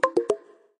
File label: UI Disconnected; BTD6